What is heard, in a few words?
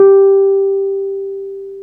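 piano Wurly G4; Keyboards of The 60's and 70's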